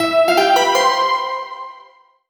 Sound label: Trade Success